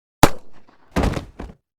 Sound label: shoot and fall